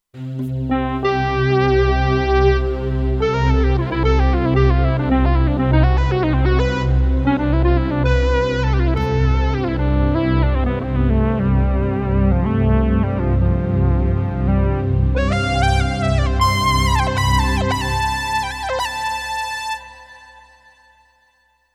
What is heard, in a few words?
Wah Ped Zawi Split, Kurzweil K2500X